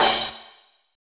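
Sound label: cymbal, disco; Best Service ProSamples vol.48 - Disco Fever; AKAI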